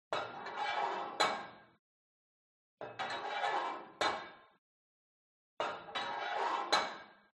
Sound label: SPORT GYM Put Weight Onto Barbell Quick